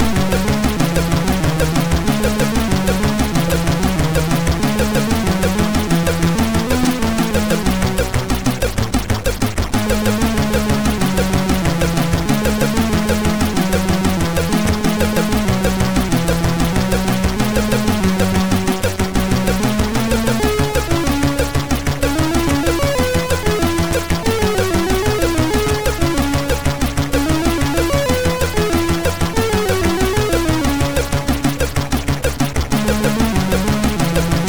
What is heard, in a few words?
Noise MOS6581R3; Noise Part tune 5